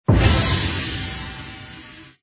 UI Double; football; Brazil

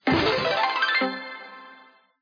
UI line; football; Brazil

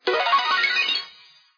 UI line; football; Brazil

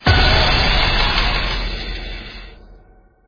BGM mega win end; football; Brazil

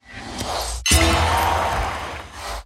coins Bomb; poker; Brazil

